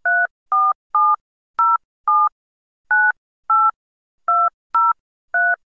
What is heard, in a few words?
Dialing phone number on telephone